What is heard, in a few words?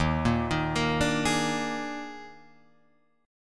D sharp Major 7th Suspended 4th D sharp Guitar Drop D, chord; chordshape